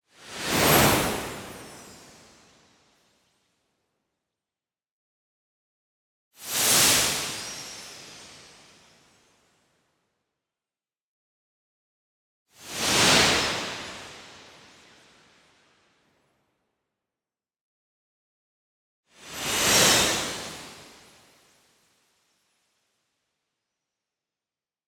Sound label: WHOOSH Noisy Glitter Shing; boom library; Anime Essentials